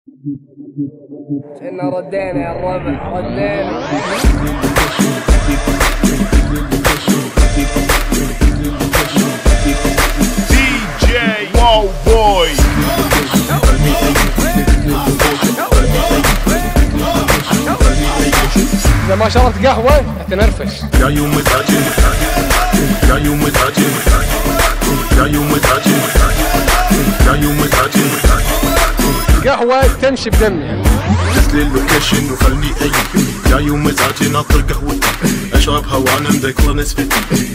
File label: arabic remix